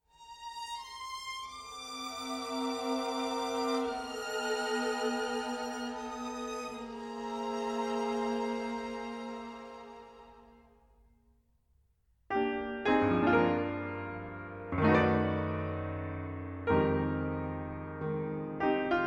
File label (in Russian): retro kvintet